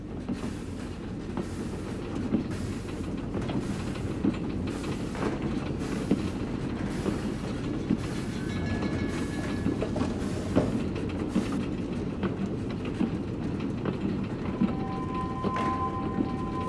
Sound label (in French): boucle dans le train suspense passagers; terreur sur l'orient express